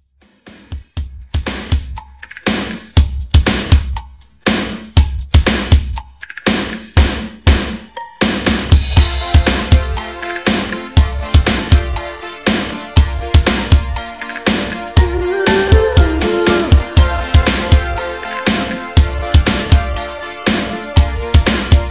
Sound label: Re drum